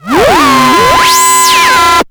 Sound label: Ring Mod Noise, SFX, Electronic; Sonic Foundry (Sony Creative Software); Electronic Point Blank